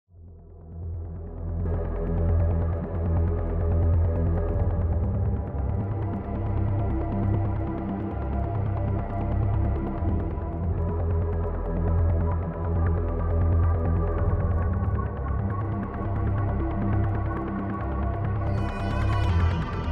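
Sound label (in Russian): тревожная атмосфера кино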